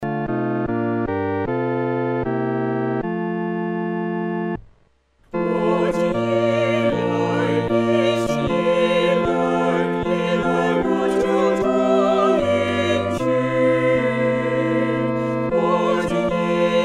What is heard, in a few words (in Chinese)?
合唱 四声部